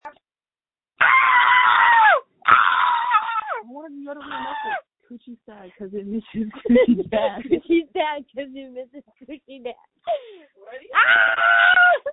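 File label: screams that feature laughter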